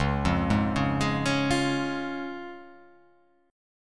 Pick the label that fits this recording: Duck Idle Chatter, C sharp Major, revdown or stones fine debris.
C sharp Major